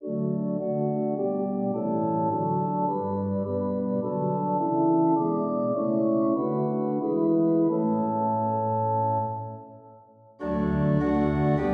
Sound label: Organ